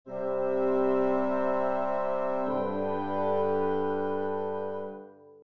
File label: woodwind pairs